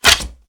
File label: release crossbow; Mount&Blade Warband